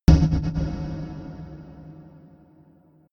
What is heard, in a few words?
energized fuse; whooshes-rises; hits-impacts